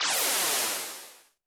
Roland TD-20 Percussion

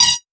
Criket Metal, Esoterik Beatz; Cricket

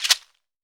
chekere; Africa

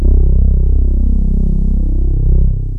JX3P STRINGS, Roland JX-3P; TECHNO-BWARE

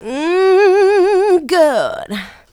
Female Vocals; Houseworx

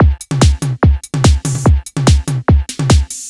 145 No Perc, Drum Loops; Zero-G; Soundclash Nu Dance Electro; Acidized Wavs; 145bpm; Undercore

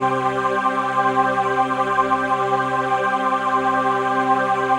PHASE PAD

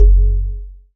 SYN WATER D, Microwave; Sound & Vision - Gigapack I CD 2

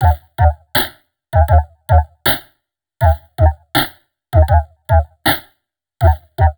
Drum Loops Crazy Processed

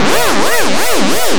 fx loop, big beat; Big Beat Samples & More; AKAI; FX LOOPS KIT

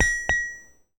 Small Anvil, contact mic, Metalics

